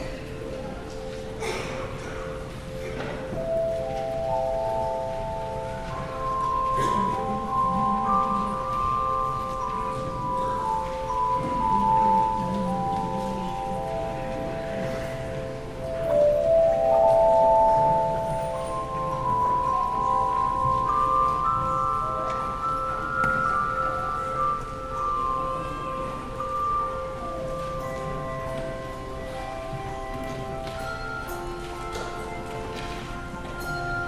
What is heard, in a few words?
Handbells; Prelude on Thaxted